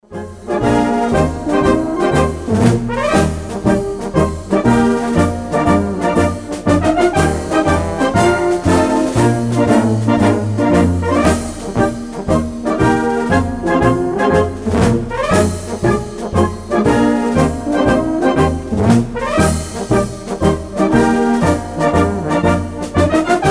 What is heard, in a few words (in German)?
Marsch